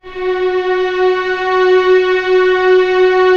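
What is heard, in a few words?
strings F#4 PP R; Sound On Sound - Peter Siedleczek's Orchestra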